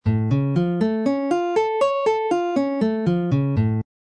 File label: a augmented arpeggio pattern, guitar